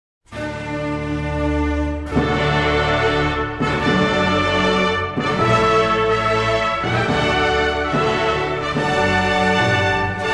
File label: Overture; Handel; Fireworks